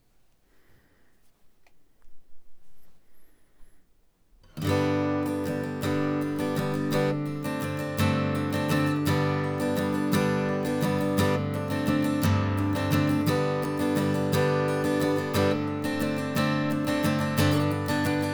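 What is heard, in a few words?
Acoustic Guitar Stroke Most Neck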